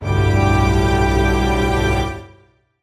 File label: voicings examples